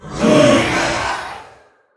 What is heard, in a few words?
Amgam Rager Death